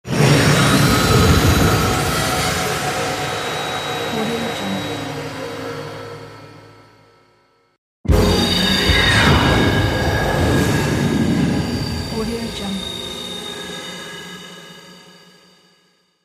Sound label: dark field